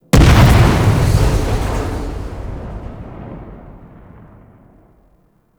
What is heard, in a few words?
Air Bombard Explosion; Warframe; Venus; Venus Air Bombardment